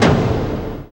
TOMS; East West - Phat & Phunky

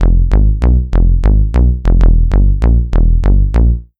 Bass Line; Eclectic Electronica

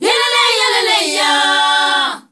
ZULU CHR, FEM. CHOIR

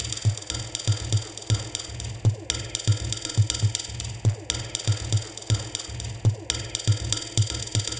Minimalism, Processed Percussion

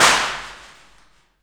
PRC ALMWA, Aluminum Wall; Rhythm Section; FX Perc

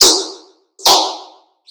BASS SHOT

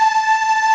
Ending loop

